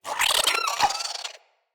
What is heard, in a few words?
Squid Plant Attack Vocal; Traps